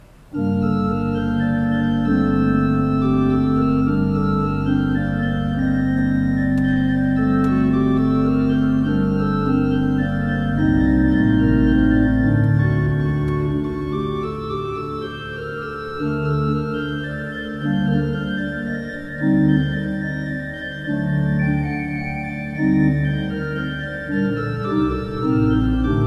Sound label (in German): Niederlaendische Orgel